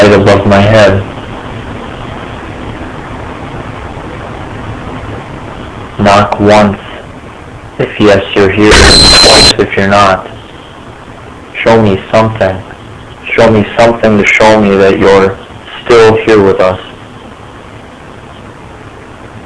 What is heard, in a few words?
Screech; Menger